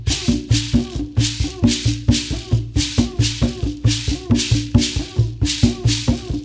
RITUAL PER, RITUALDNC; Spectrasonics; Heart of Africa 2

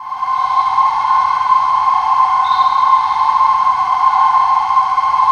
CRIK CHOIR; Spectrasonic Distorted Reality 1; CRICKETCHOIR

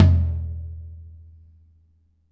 R B Floor Tom A Close; Sonic Foundry (Sony Creative Software) - Drums from the Big Room The Mixes; R&B One Shots

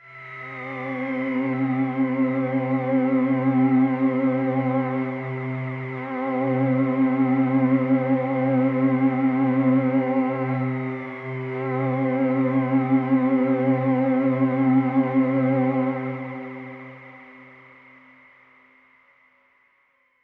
Pad C, Synth Prophet One Shots; Electronisounds

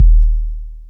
ASR-X Kick, Ensoniq

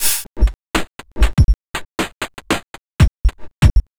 Skunk Beat, Electronic Beats; Sonic Foundry (Sony Creative Software); Myths of Technology

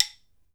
KALAAW STI-R, HAWAII PERC; AKAI S6000 CD-ROM; WorldSounds

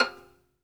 SOLO VI, Synclavier Strings; ILIO

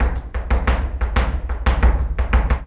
Flamenco, ZAPATEADOSOL

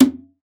Conga, Session Instruments; Best Service ProSamples vol.42

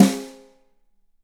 4X15 BRUS, BRUSHES; Big Fish Audio; Ross Garfield Drums VOL-2

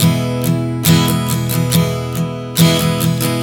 Strum 070 E, One Chord Strumming 070 bpm; Sonic Foundry (Sony Creative Software); Songwriter Acoustic Guitar Companion

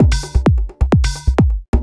4 floor beat, trance

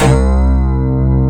SEQ BASS, KORG O1/W; Rubber Chicken Software Sample Bank

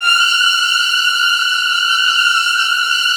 F3, Strings, STR HLF TRLL; ILIO; Kirk Hunter Virtuoso Strings VOL-3; 90 s Sample CDs

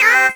dance hit; E-MU Formula 4000 Series Vol. 2; Dance Hits

